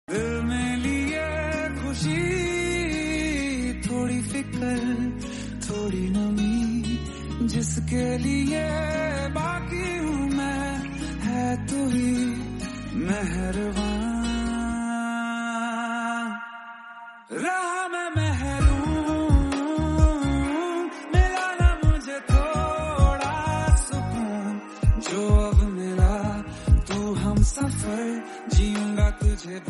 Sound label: Hindi Love